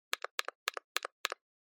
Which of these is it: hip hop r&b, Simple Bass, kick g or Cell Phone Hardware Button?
Cell Phone Hardware Button